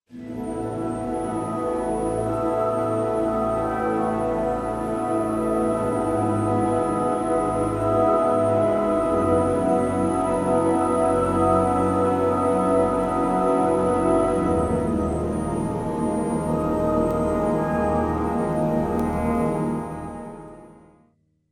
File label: multiphonic orchestra; NI arkhis